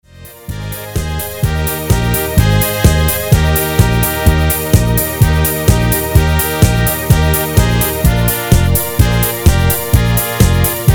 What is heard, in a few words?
MIDI